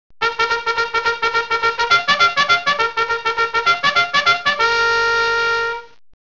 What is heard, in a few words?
charge, bugle sounds